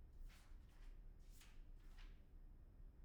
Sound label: pageturn; IEEE AASP